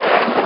body scrape; GS13; yogstation

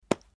tap, weapons

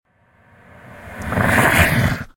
growl, hallucinations

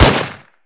shot, weapons

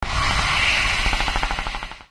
hiss

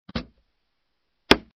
stamp; Paradise

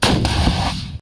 rocket launch, weapons

